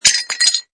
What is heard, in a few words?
Impact Tile Smash, Tile or small ceramic slab falls and breaks on surface